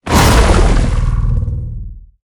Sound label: hit wall; smite; heimdallr